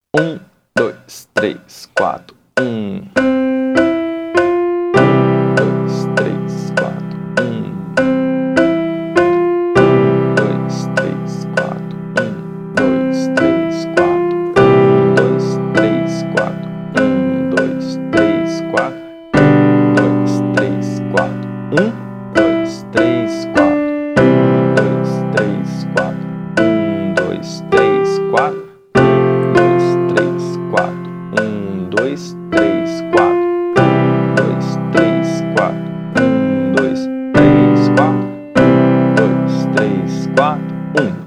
When the saints go marching in 100 BPM

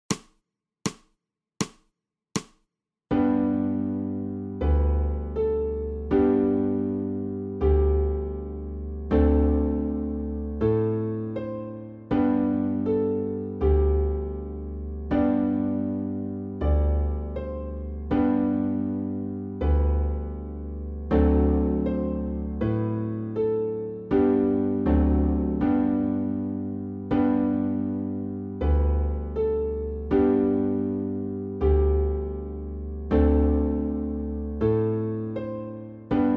PLAYBACK SOPRANO; Go Tell Aunt Rhodie

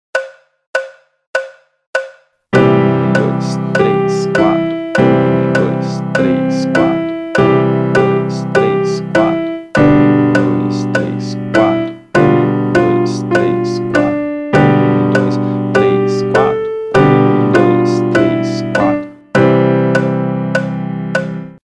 100 BPM; Marcha Soldado

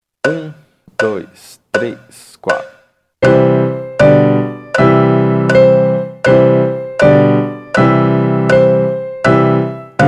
Frere Jacques 80 BPM

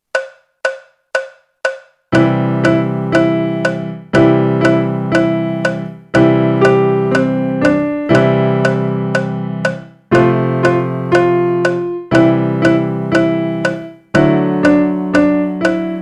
120 BPM; Tocam os Sinos

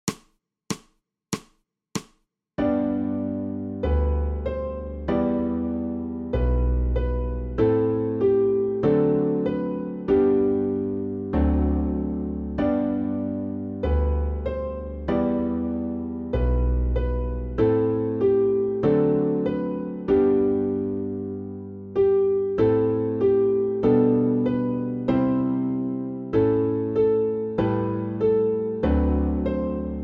SOLO SOPRANO; Hansel e Gretel

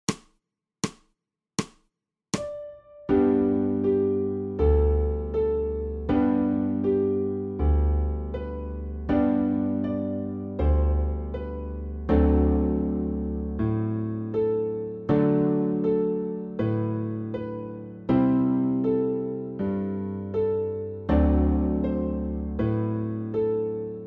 PLAYBACK SOPRANO

